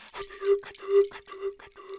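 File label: Music Village Calm Near; from dust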